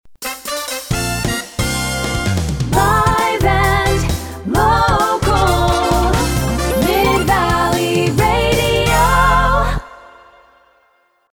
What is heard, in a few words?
Live and Local Radio, Sung Jingle; Mid Valley Radio